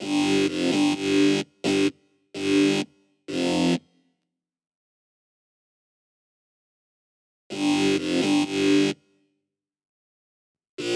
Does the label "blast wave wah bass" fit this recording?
Yes